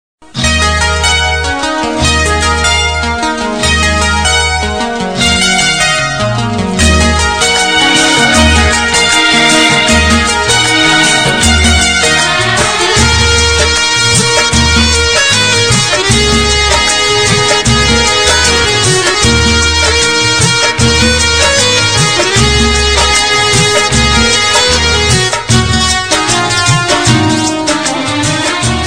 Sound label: theme instrumental ringtone; jaanbaaz